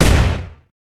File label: fire, weapons